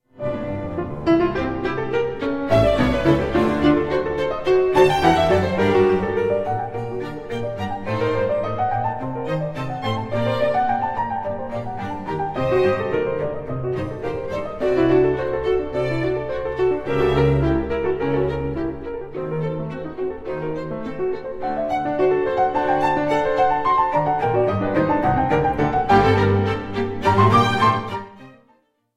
concerto no 1 d minor keyboard and string orchestra; BWV 1052; 1st mvt allegro